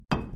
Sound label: Impact